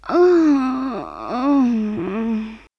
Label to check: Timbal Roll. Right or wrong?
Wrong